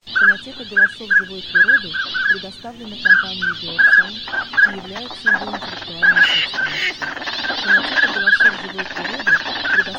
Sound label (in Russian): Шилохвость; CODONE